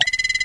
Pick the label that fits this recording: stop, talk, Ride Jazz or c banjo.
talk